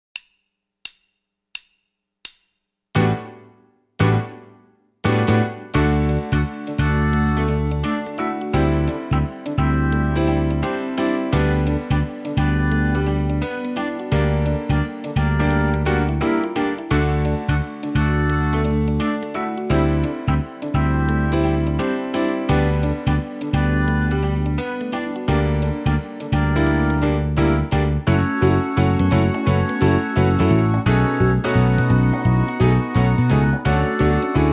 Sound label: Backing 16th Shuffle